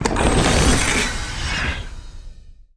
Forge Activate; Environmental; Dungeon Defender; DunDef

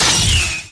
Crystal Hit; DunDef

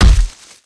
Impact dummy hit; Impacts; Dungeon Defender; DunDef

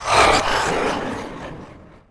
Dark Elf Warrior death; SFXEnemies; DunDef

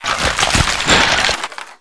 Skeleton death; SFXEnemies; Dungeon Defender; DunDef

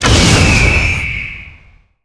Piercing Shot; Player; Huntress; Initiate; Dungeon Defender; DunDef

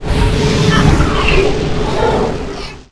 Player Spawn; DunDef

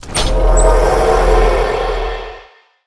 Tower Summoned; DunDef

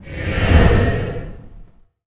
Menu Swoosh; DunDef

